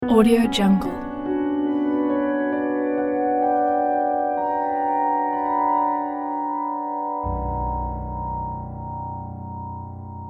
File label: ominous and creepy logo; jmac713